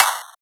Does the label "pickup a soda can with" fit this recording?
No